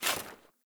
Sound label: Foley Action Armour Walk; Characters; Player; Deora Armour